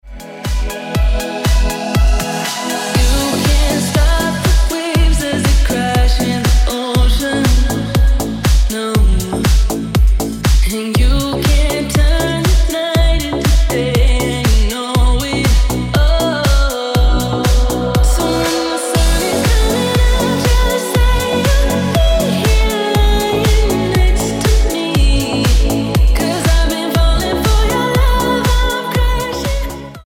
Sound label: Ringtone